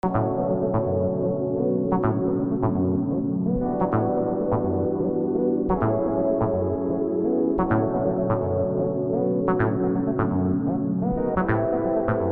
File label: acid ocean